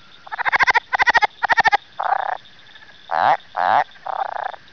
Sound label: southern leopard frog call; Texas; amphibian watch; texas nature trackers